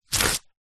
book large thick pages several rip out simultaneously; household